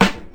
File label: Nice Steel Snare Drum Sample G Key, snare drum sound tuned to the G note; snare drum samples